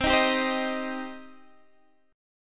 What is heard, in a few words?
C Minor C down Guitar Open D